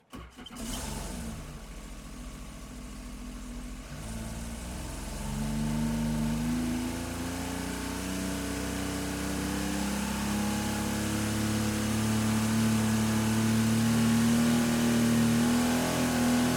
JET SKI PWC SEA DOO extra startup to full speed to off slowly; The Chris Alan